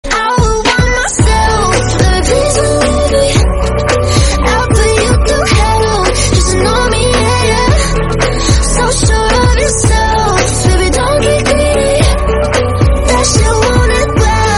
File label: English Song